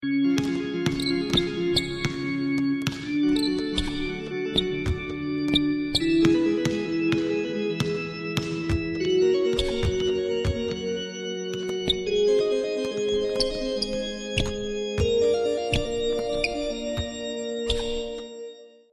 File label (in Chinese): 风琴上行; 战中; 战斗音效; nba